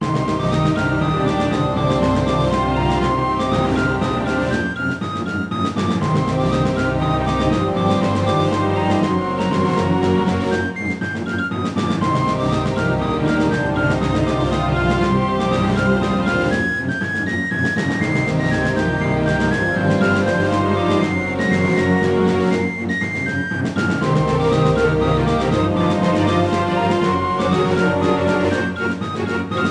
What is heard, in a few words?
Boss Duel Music Nuetral